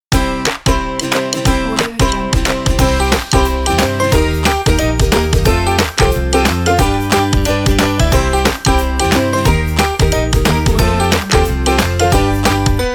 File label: fun upbeat quirky intro logo